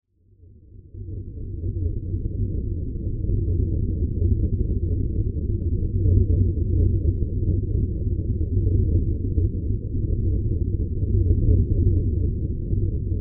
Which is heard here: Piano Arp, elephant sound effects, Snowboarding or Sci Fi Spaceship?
Sci Fi Spaceship